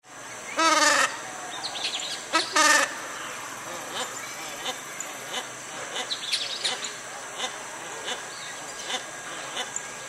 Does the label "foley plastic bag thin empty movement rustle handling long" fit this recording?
No